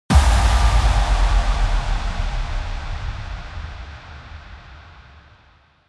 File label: IMPACT